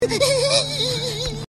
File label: laughing sound effect